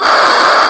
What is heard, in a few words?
whipped cream